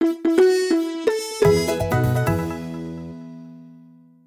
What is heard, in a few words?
birthday end stinger; easteregg